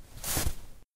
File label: ice, footsteps